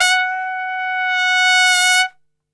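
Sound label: F#3 trumpet; Best Service; Brass Super Section; TRP SWL; 90 s Sample CDs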